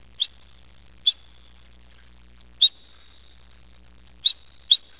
otter happy

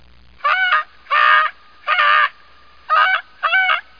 snow goose

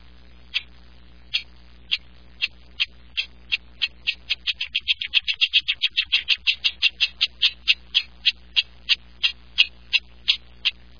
cricket frog

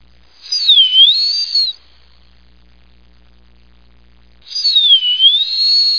peewee

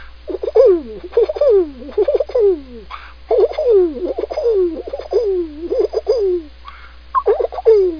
pigeon

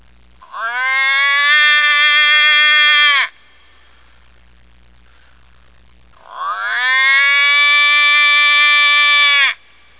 toad

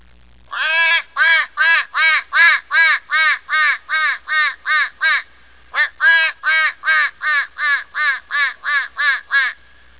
mallard

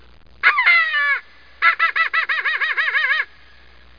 pronghorn

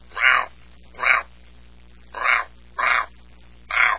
kingfisher

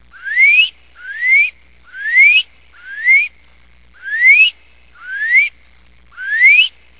peeper

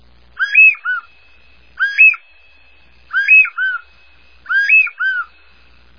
covey

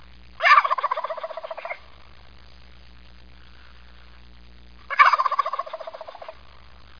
turkey

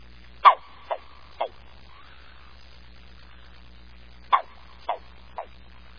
green frog

